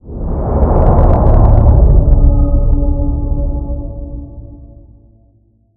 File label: obstacle